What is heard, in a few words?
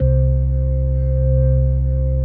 C1S, B3